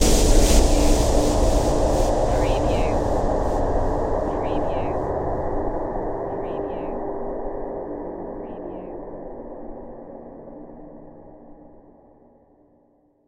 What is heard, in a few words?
Hit Airy